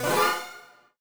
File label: shredder alert; battle